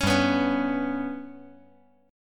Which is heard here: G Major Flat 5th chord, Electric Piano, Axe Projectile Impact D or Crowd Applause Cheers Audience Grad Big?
G Major Flat 5th chord